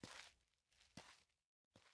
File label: Look Back; LOOPERS